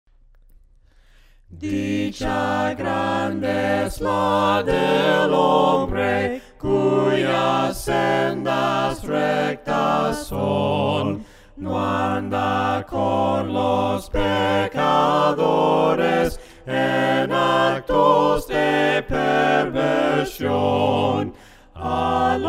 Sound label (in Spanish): Dicha grande es la del hombre melodia palabras